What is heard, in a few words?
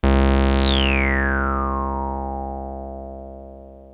303 C2, TB 303; Hollywood Edge; Giorgio Moroder Rare Synthesizer Collection